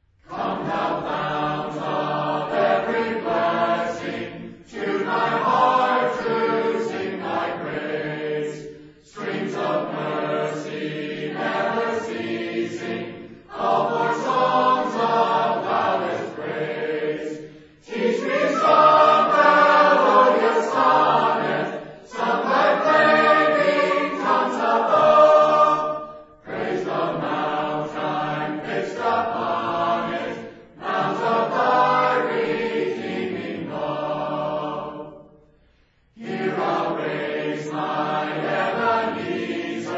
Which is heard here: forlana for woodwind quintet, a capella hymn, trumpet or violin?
a capella hymn